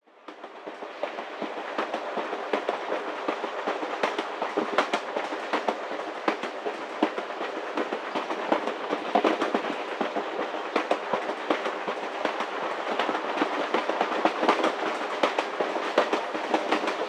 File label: Train Sounds Soundscape